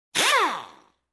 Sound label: Electric Driller Revving sound